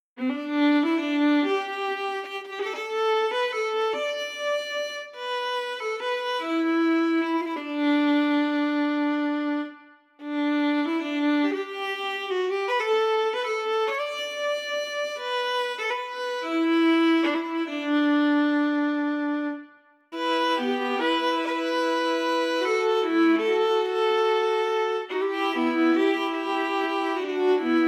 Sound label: Traditional Orchestrated (Naked), celtic fiddle; Sea To The Skye; red room audio; traveler series; kontakt